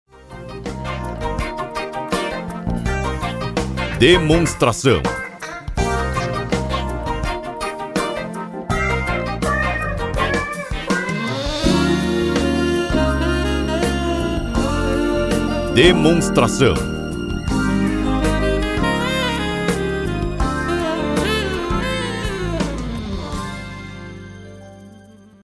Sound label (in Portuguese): Trilha